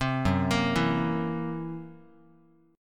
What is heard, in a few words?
Gb 7th Flat 5th Gb down Guitar; 3,2,1,2,x,x; Listen to Gb7b5 arpeggiated